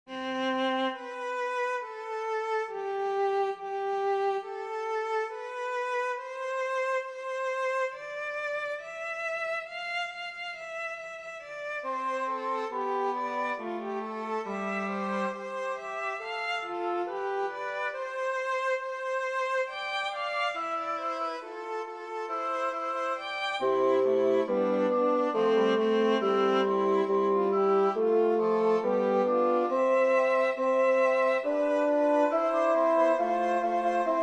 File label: Trio Canon